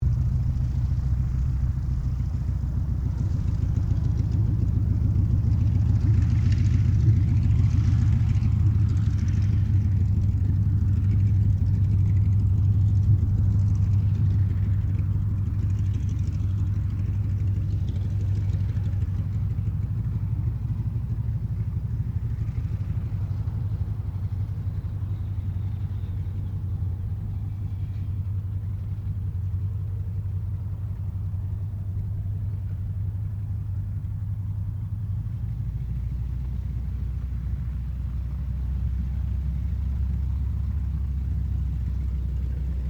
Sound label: AIRCRAFT CAC WIRRAWAY TAXI SHUTDOWN DISTANT STEREO; CA-16 Wirraway; Airplanes